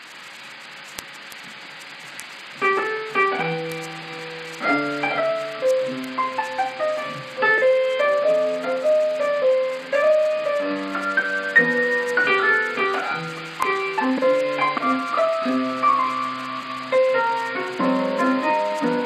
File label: Piano